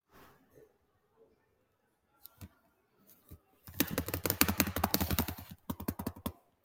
bistroe pechatanie

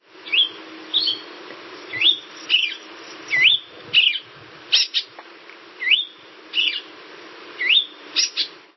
melodichnoe nasvistivanie tanagri v gorodskoj srede

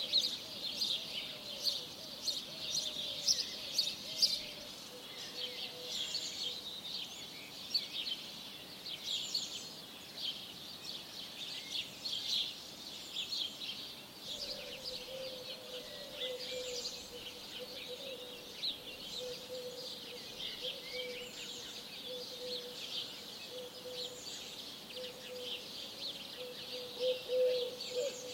nezhnoe spokojnoe predrasnoe utrenne uspokivayushee redkoe penie ptic

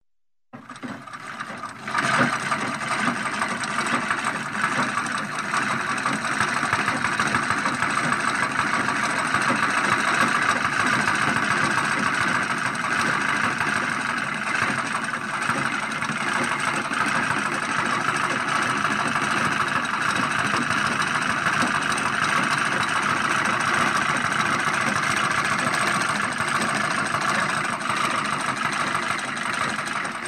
zvuki nozhnoj shvejnoj mashini

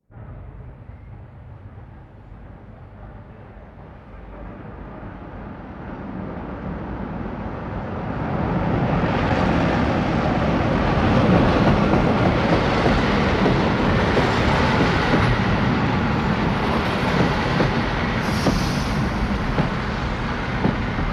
pribitie elektropoezda

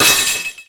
skrezhet metallicheskih diskov ot pili

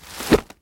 rezkoe bistroe avtomaticheskoe otkritie zonta

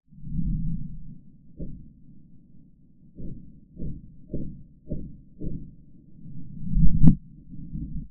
vechnij ogon na rasstoyanii

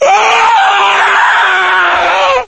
vopl odinochnii dolgii muzhskoi rezkii

